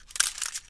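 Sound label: magazine falling out of a pistol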